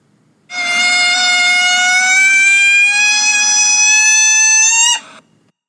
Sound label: balloon squeal